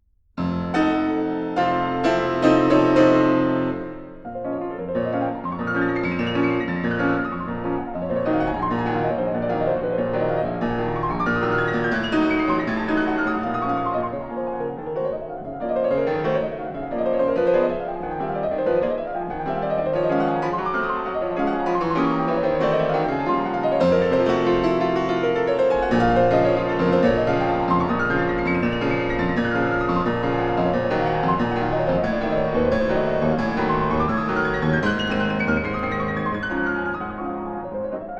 B-flat minor: Presto con fuoco, piano; 24 Préludes, op. 28 no. 16

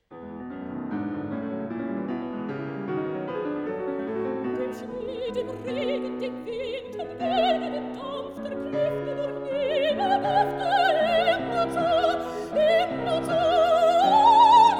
song for voice & piano; Rastlose Liebe (＂Dem Schnee, dem Regen＂)

